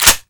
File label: lever action down; garrysmod